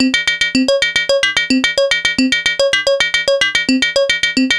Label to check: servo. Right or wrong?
Wrong